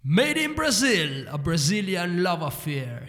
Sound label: Jingles; madeinbrazil